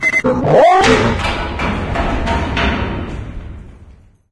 hunter sighting; video games; ambient; source engine; portal2; outland

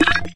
portal enter, portalgun; Source Engine; Portal 2

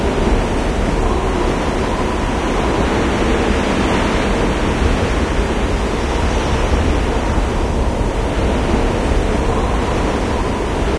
airport ambience wind outside; video game; Left 4 Dead; Source Engine; ambient

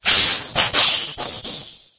rage at victim, infected female; npc; Left 4 Dead 2; Source Engine

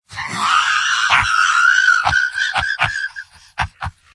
soldier laugh long; mvm

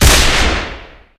spy revolver, weapons; enforcer; tf

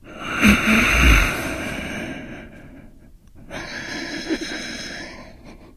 breathing, infected

